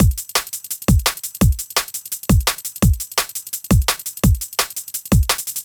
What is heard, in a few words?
Drum and Bass Rolling 170 bpm; Loops; Ableton Live 12 Lite; Core Library; Drums